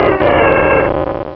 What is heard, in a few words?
Cry